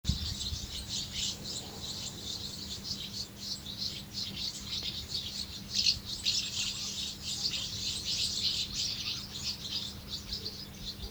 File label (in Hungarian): molnárfecske hangja